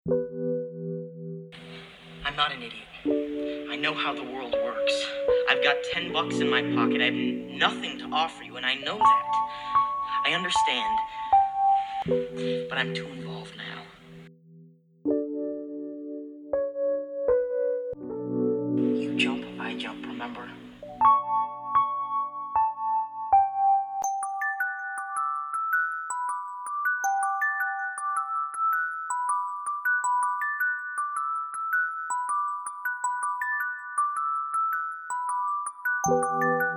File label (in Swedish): Musik improvisation